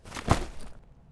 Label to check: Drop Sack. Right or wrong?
Right